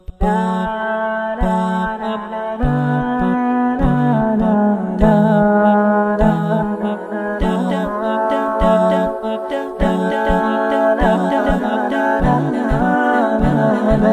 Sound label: music